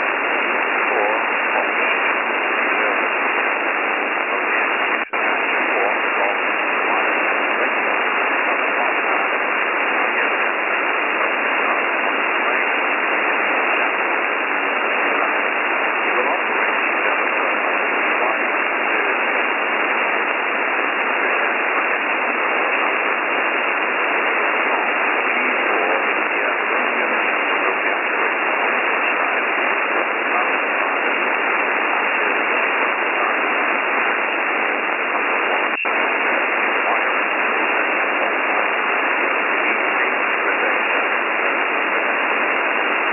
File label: Combined SSB; Combined SSB NC min3dB